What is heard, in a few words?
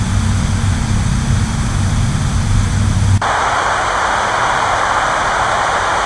monopole noise